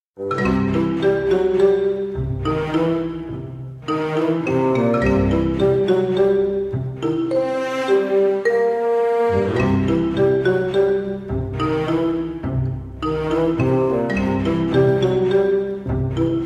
Piano and Strings

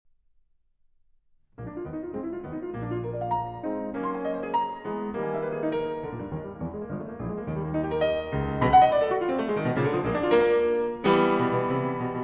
Piano Sonata in B flat major - Vivace; Op.84 - III.

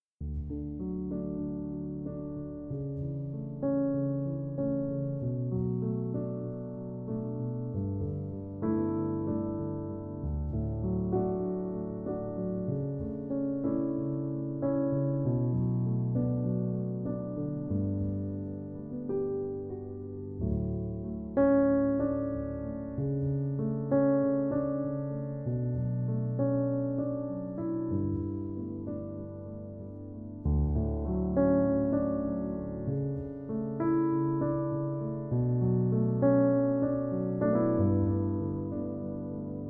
Piano Version

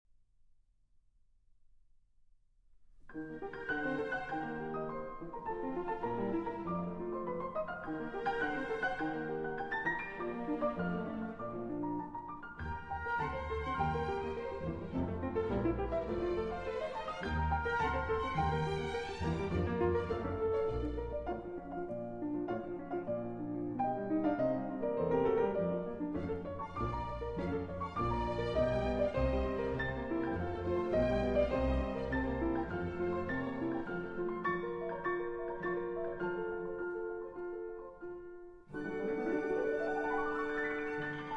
Piano Concerto in B-Flat Major; IV. Allegretto Grazioso – Un Poco Più Presto

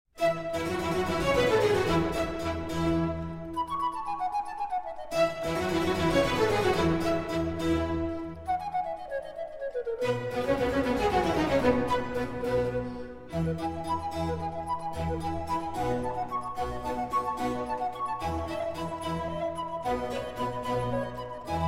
Concerto in F Major For Flute & Strings; La Tempesta di Mare; III. Presto

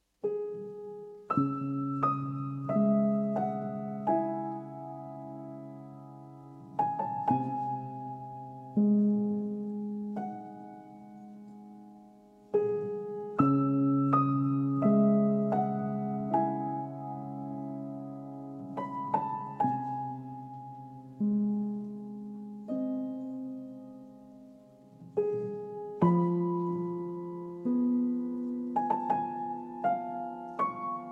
Piano Solo

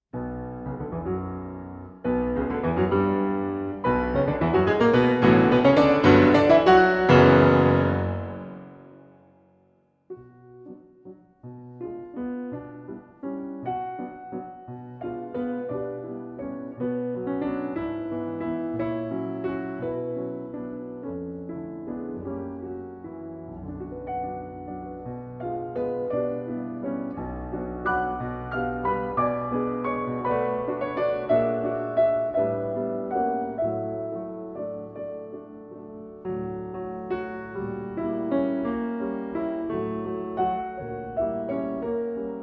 Valse-fantasie in B Minor for Piano

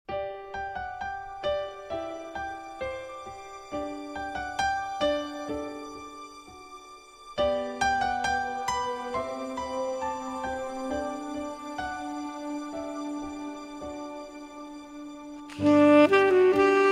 Major Lullaby

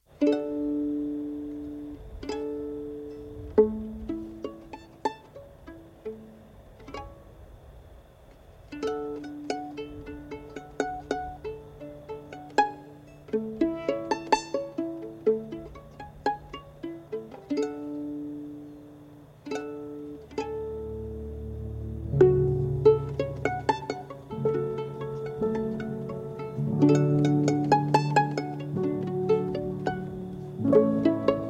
Improvisation; at Heart Mountain

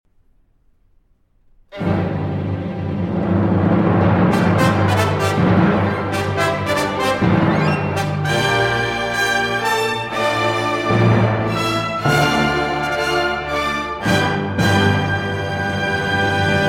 Concerto For Piano & Orchestra

